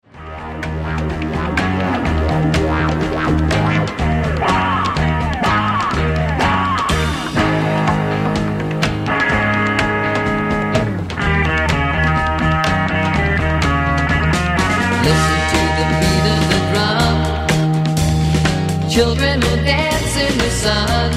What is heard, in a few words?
Beat Of The Drum